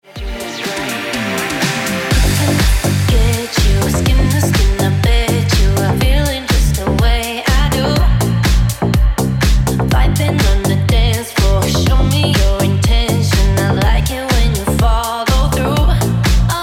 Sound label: Ringtone Deep House Music